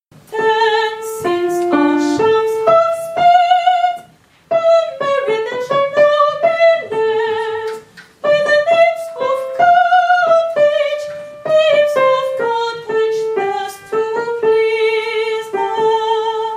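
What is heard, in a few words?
soprano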